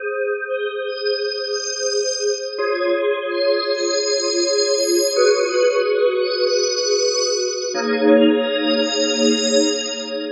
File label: Organ Pad, 93bpm; Zero-G - Soundclash Nu Dance Electro; Acidized Wavs; Construction Kits; Smooth Circuitry